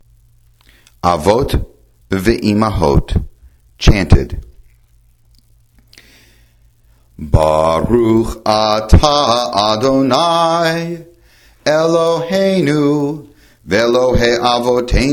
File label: avot v imahot chanted